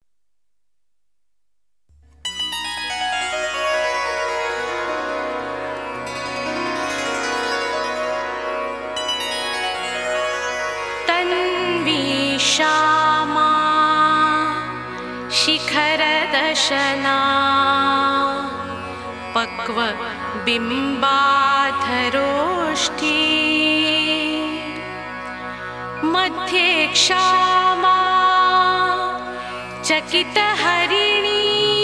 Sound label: Shloka